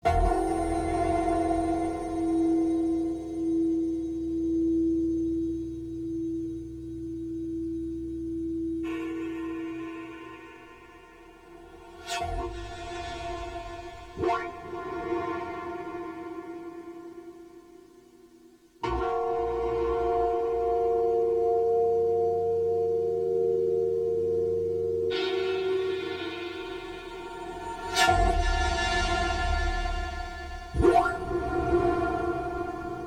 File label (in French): Espace reverb Phasing; Novars